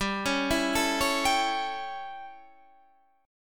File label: A 7th G, chord